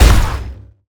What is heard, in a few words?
shotgun sap; Mindustry